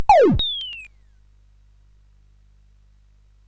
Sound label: SFX; Narco Police